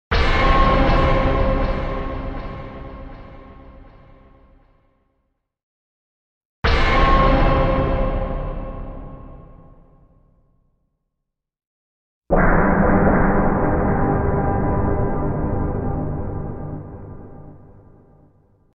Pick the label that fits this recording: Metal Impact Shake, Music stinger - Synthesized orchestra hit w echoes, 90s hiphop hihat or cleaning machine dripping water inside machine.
Music stinger - Synthesized orchestra hit w echoes